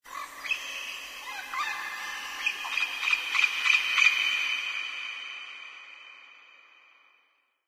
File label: animal call bird; Source Engine; tf; lair